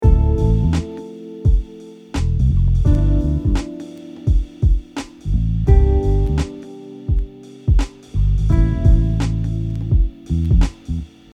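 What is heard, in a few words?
targeting 5ths chord tones, guitar; Targeting 5ths example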